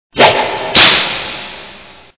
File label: whip